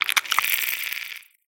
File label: curious, clams; infestation